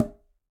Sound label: Tumba B Tip, Latin Percussion; Drums; Drum Hits; Conga; Ableton; Factory Packs